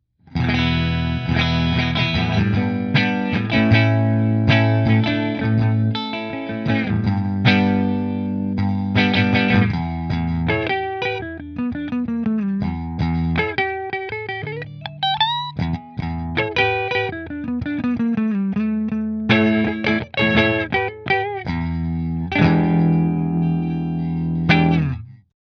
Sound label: Master Middle Fender; Masters Guitars LPM Pro Cherry Sunburst